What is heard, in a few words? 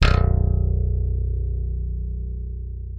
B0, slap thumb; Xsample VOL-1